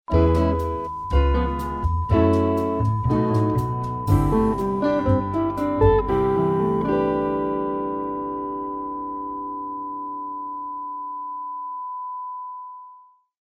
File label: groovy jingles